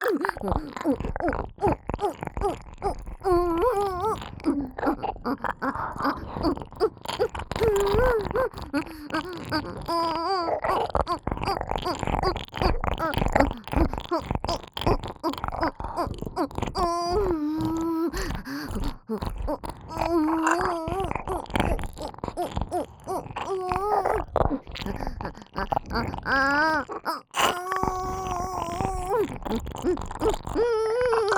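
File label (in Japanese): オホ喘ぎ SE無＋ぐぽぐぽシンクロ耳舐め; 3WAYループ; おまけループ（オナサポ）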